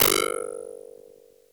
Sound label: FM SYN PERC; Crypton - DrumBase5000